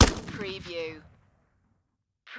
Machine Gun Steyr Aug

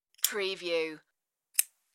Lid Lighter

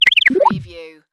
Scifi Production Element - Odd Being

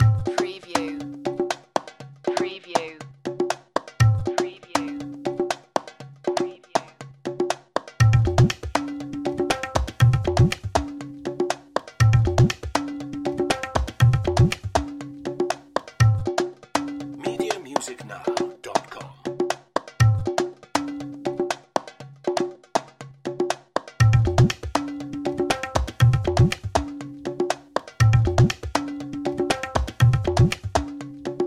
Musical; Production Element; Sound Effects Bible

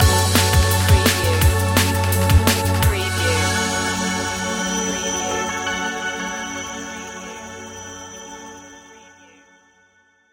velodrome stinger

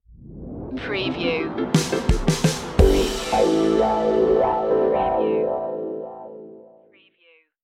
drive mode stinger

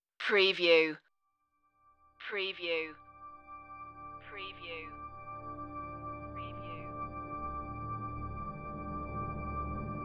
intro no choir

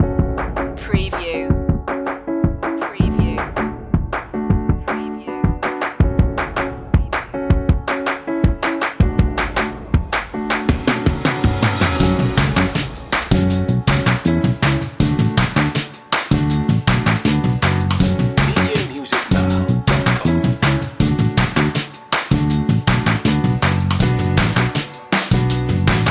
funky hop underscore

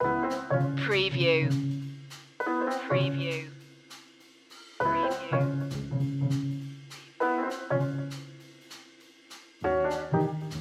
bugsy bop loop

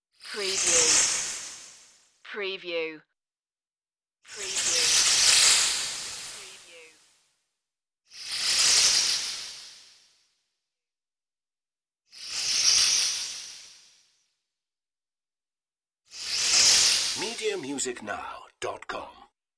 high frequency swooshes

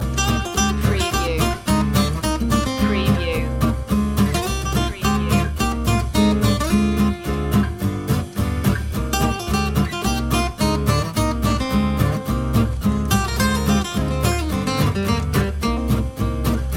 a minor swing loop

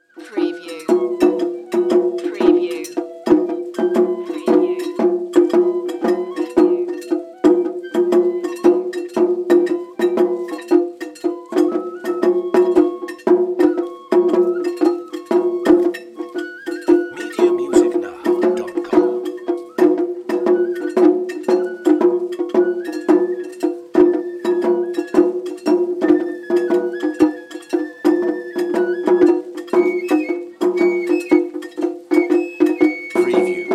traditional music drums flute Kamasura Japan

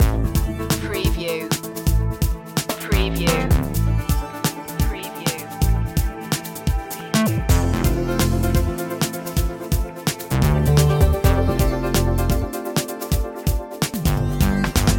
broken beats loop, chill

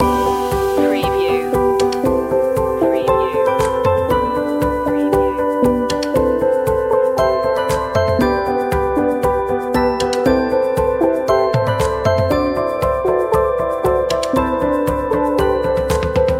gentle rain loop

